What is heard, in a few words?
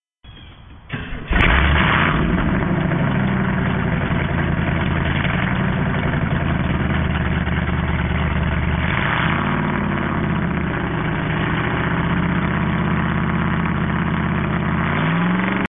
chambered, exhaust